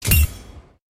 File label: Headshot; Overwatch